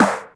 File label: Snares Electro